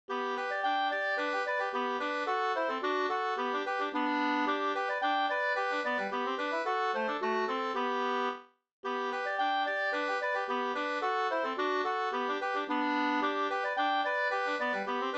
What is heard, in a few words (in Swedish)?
folkdans kadrilj; Landskronavar